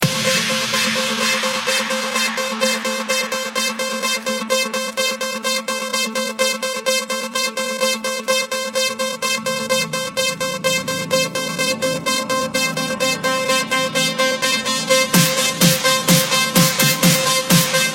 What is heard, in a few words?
Electro